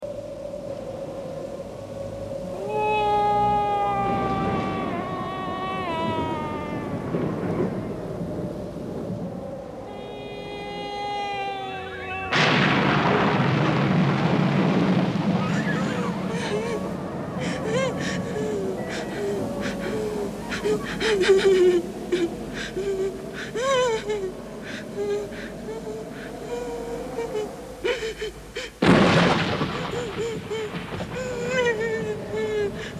schaurige Musik & schluchzende Frau; Horrorgeraeusche

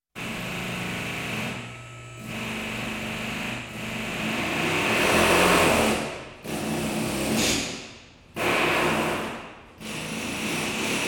autowerkstatt; Geraeusche

